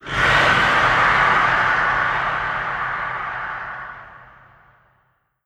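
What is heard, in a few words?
front left, antlion distant scream; creatures; Model Pack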